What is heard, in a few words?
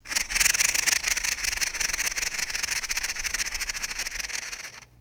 plastic toy pinwheel spinning and clicking; toys